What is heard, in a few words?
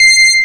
C7 helio, Bass; Giga Samples Collection; Helium